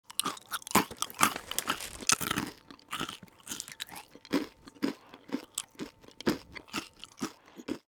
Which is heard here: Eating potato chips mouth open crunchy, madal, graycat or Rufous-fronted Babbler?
Eating potato chips mouth open crunchy